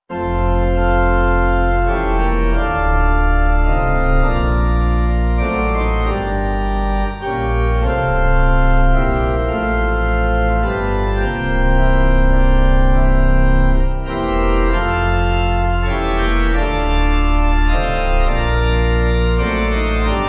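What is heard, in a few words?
Pipe, Pipe Organ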